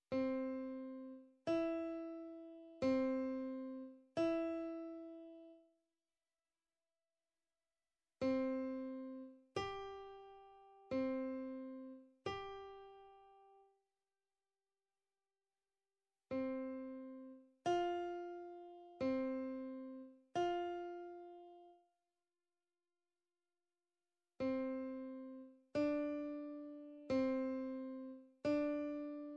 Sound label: Recognise 1 note after tonic played C major C E C G C F C D C C